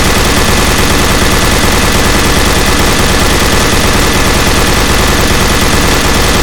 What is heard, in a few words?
tank mini shoot, weapons; tf2classic